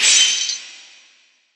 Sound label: Drop Keys